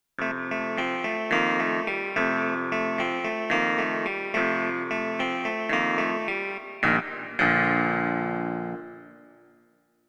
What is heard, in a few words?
Clavi KSP, Yamaha Clavinova CLP-270; I SUONI - GLI STRUMENTI XG